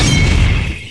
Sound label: Magic Pin Explode